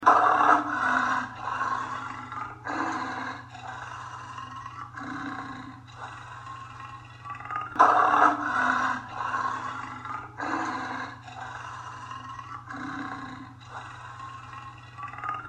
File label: roar, africa